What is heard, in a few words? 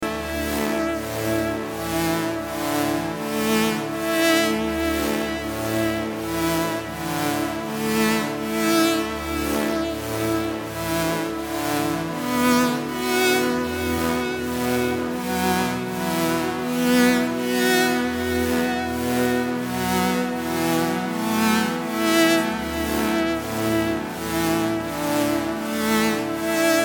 Synth